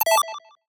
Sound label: Buttons, High Tech Interface Sounds; Lesser Vibes